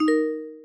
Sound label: notification; faugus-launcher